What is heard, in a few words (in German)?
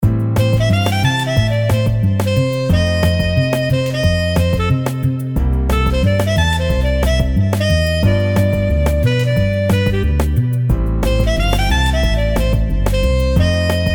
Melodie